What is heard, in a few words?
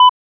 1khz beep minus6 loop; Half Life Alyx